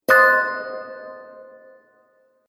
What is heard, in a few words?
Fairy Fantasy Surprise, magic